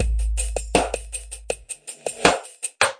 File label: crakkle reverse hit, drum loops and breaks, 080 bpm; 000 to 080 bpm